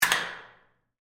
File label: Stall Door Latch, Stall, Door, Latch, Lock, Public, Bathroom; Office; Unlock; Public Bathroom Sound Effects; SoundEffects-BlastWave